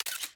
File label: Camera